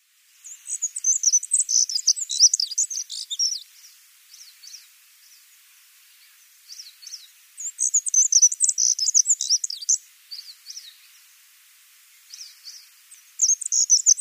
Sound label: Black-throated Accentor song